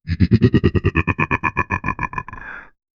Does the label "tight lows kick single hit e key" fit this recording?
No